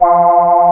AN SYN, SYN & LEAD; SAMPLE WAVE MIXING 2

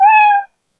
Animal, Yamaha DD-20

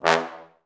TB STAC E1; Best Service; Peter Siedlaczek's Advanced Orchestra

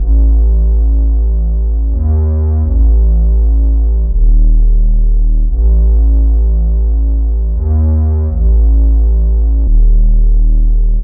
Low Bass, Drum n Bass; KJ Sawka; Drum n Bass & Beyond

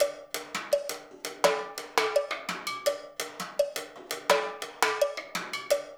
percussion loop; InVision Interactive; Percussion Slam; CAFFEINE

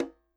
CH op s, KILLER CONGA

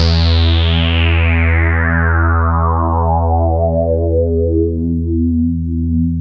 JUNO SWEEP; E-MU Formula 4000 Series Vol. 3; Analog Odyssey

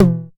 909 TOM HIGH; TR808+909

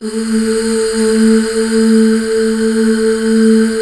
UUHH A3 R, Hallelujah, BACKGROUND; Best Service

